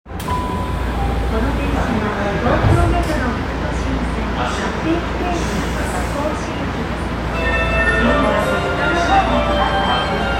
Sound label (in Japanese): 副都心線小竹向原北